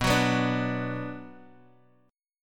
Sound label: B Minor B Guitar Open F, chord; Bm strummed; x,6,6,6,5,x; JGuitar